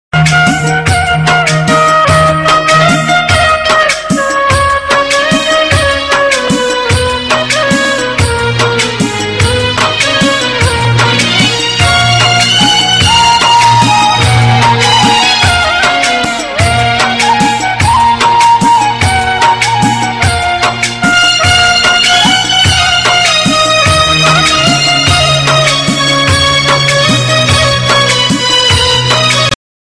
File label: pashto new ringtone